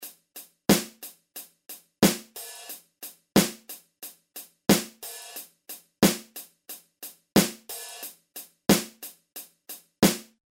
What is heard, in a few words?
compression; exemples pratiques simples avec rns